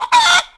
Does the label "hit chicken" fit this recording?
Yes